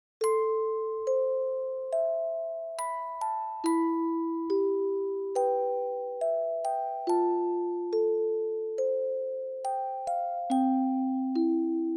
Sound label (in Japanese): Sour Sweet(オルゴール)